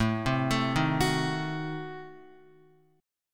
A Diminished 7th A down Guitar; Adim7 arpeggiated; JGuitar

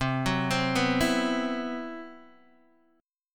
C Major 7th Flat 5th C, Guitar DADGAD

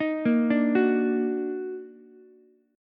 D sharp Minor Major 7th, Ukulele; arpeggiated

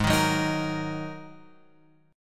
G sharp 7th Suspended 2nd G sharp; G#7sus2 Chord {4 6 4 X 4 6} strummed

